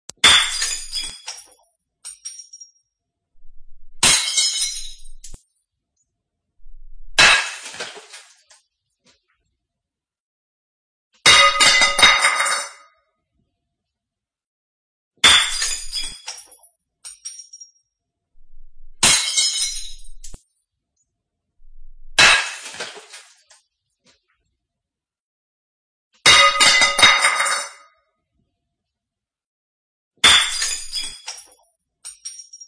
Efectos de sonido, Platos cayendo al suelo

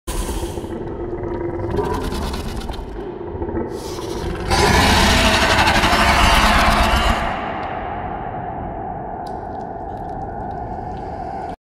Grito de alíen

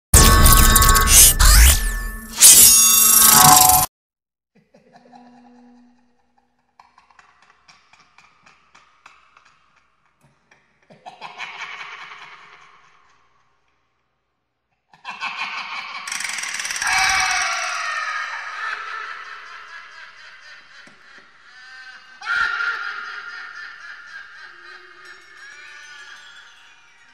SONIDOS DE TERROR, RISA; Pennywise IT

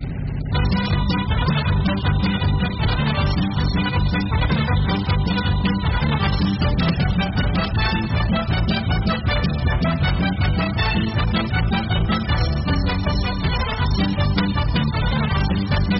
FANFARRIAS BAJO

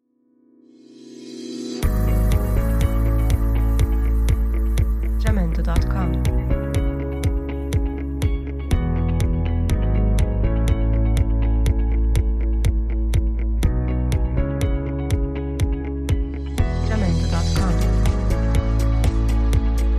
Pop Motivacion

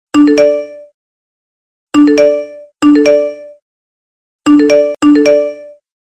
sonido de Notificacion efecto de sonido notificacion

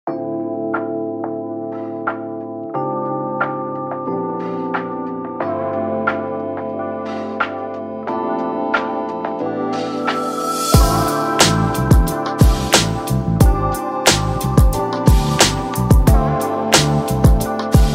Musica CHILL de Fondo para VIDEOS

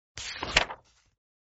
Sonidos de biblioteca cambio de página papel normal; Efectos de sonido

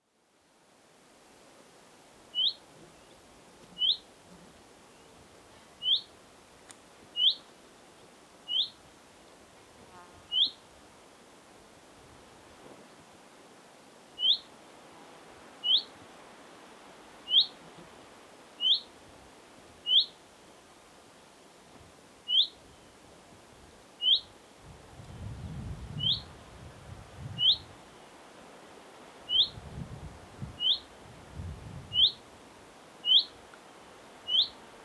Mosquitero común Phylloscopus collybita

